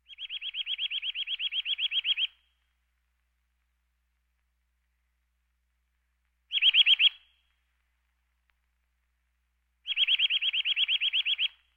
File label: Birds